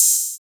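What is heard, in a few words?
TR-808 open hi hat; Hi Hats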